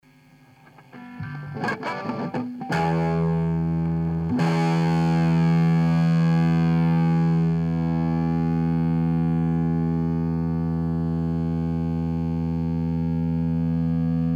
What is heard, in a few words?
Guitar tone